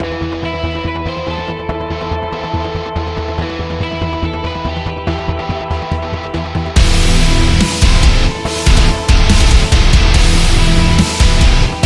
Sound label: Djent